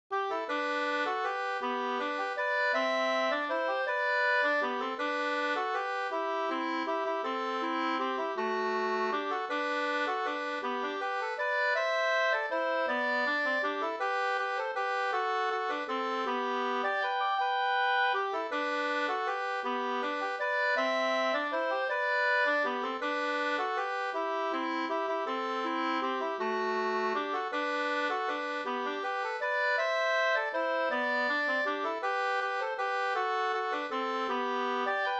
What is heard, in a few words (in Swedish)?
vals G dur